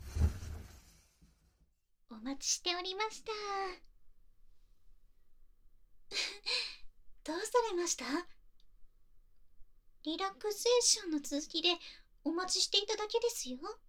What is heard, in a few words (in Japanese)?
心中ゴリゴリ高速耳かき